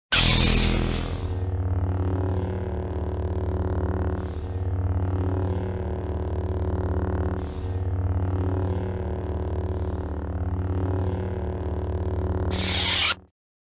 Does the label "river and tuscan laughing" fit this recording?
No